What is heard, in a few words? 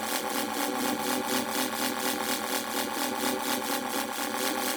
Mods Motor Small Idle Loop; Warframe; Hub; Liset Machines; Tenno Mods